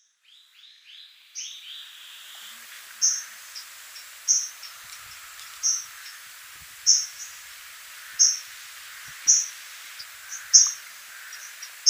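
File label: komushikui, Willow Warbler; ishigaki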